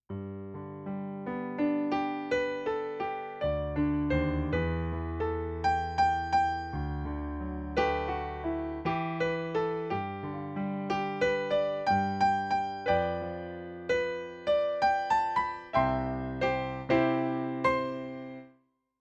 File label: Piano